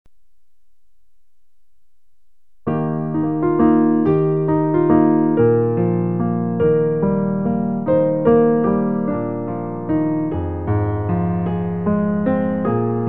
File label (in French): piano